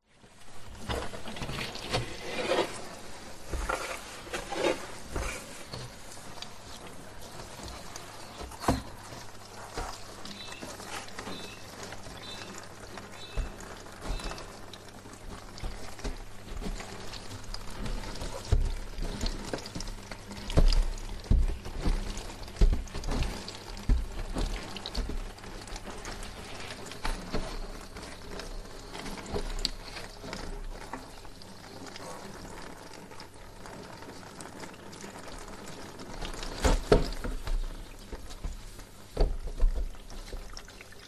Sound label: household windows being cleaned window cleaner pole brush pole drips internal; zapsplat